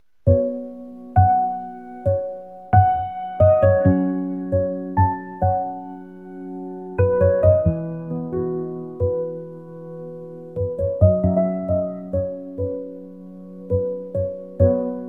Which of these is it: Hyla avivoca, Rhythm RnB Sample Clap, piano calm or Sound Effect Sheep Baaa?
piano calm